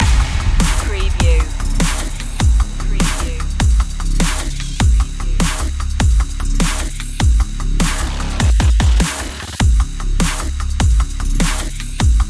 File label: hybrid human loop